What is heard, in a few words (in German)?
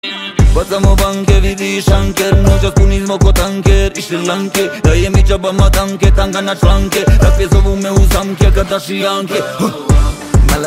bass, Klingelton